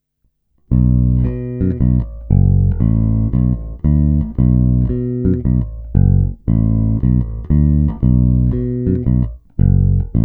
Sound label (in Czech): Tónová clona skoro zavřená, baskytara; Harley Benton MV-4MSB Gotoh BM